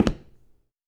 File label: Dress shoe